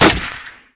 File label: M4A1